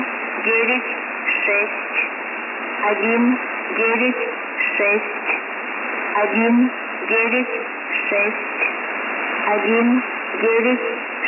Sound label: lsb msg; s06s 7795; avare